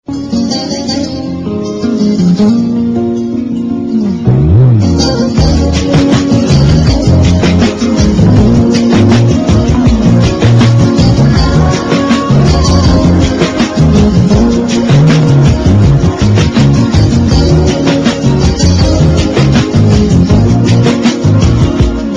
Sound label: irani guitar nice; ringtone